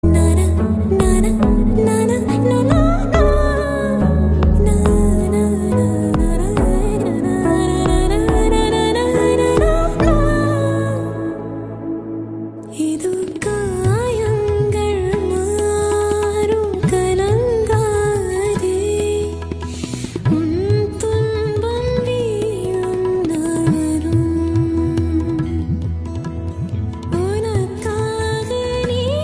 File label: Female Ringtone